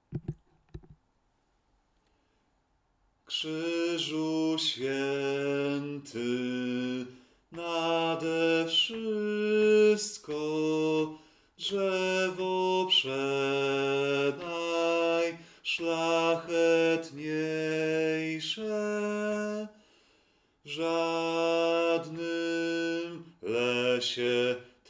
Bas; Krzyzu swiety nade wszystko; piesni wielki post

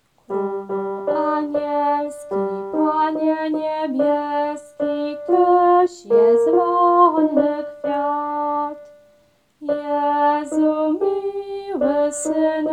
tenor; Krolu anielski; Boze Narodzenie

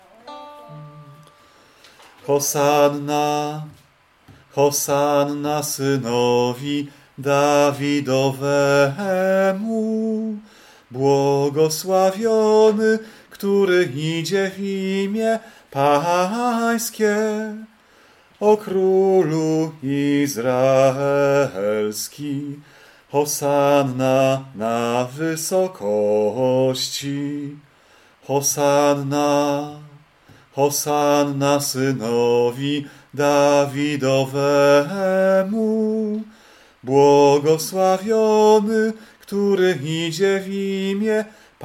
Hosanna Bas; Wielkanoc; Hosanna synowi Dawidowemu

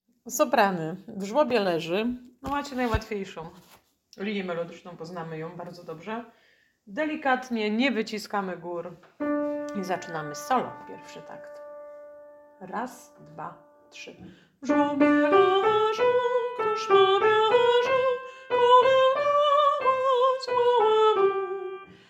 Sopran; W zlobie lezy; Boze Narodzenie

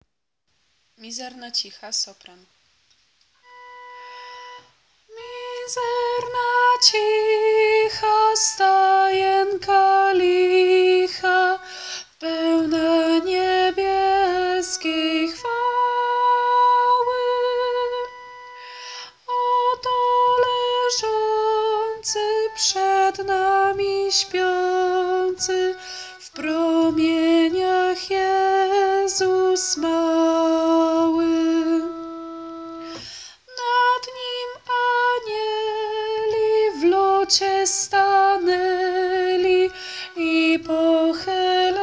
sopran; Mizerna cicha; Boze Narodzenie

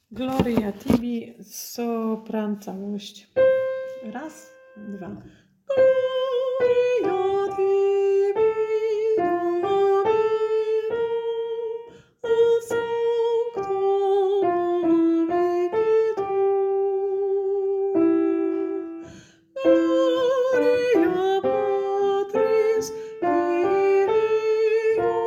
Sopran Calosc; Gloria Tibi Domine

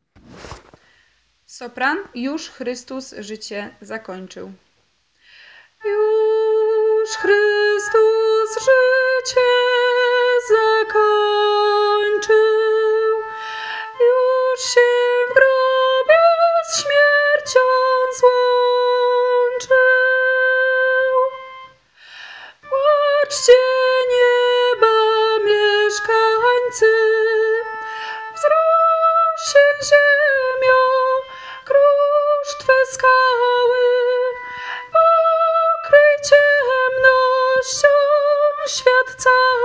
Sopran; Juz Chrystus zycie zakonczyl; Wielki Post

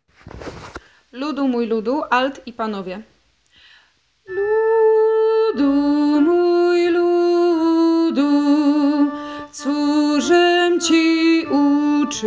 2 glos; Wielki Post; Ludu moj ludu

